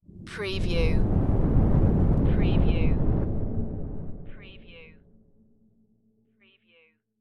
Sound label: dark wind blast